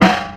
tight low end snare drum sample F sharp key, Royality free acoustic snare